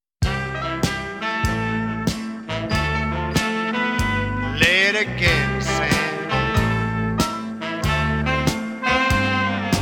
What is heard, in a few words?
Blues Medley